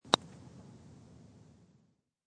Golf Putt, High quality stereo recording of a golf putter hitting a ball on the green; Golf (Sound Effects:Sports)